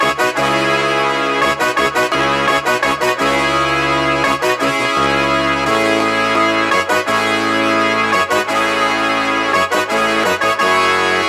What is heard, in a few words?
170 BPM C# Min Brass, Melody Loops; Loop Stems; Cymatics; Anthem